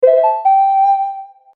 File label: Monster Whistle; YIIK A Post Modern RPG